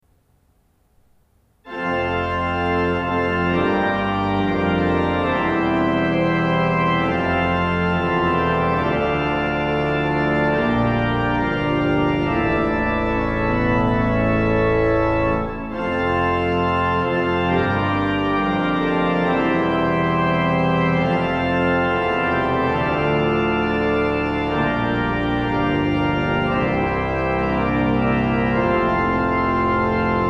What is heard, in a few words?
Fanfare; Arendal Trefoldighet